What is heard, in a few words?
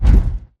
bt footstep jog parts; Titanfall 2